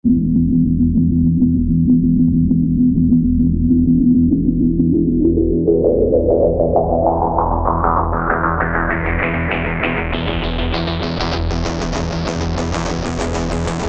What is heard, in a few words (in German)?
Voice 1 Filterfahrt